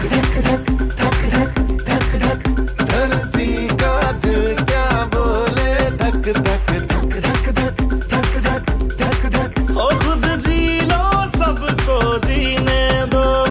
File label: new hindi music mix ringtone, bollywood ringtones